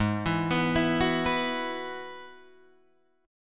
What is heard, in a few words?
Ab Minor 7th Sharp 5th Ab, down, Guitar, Drop D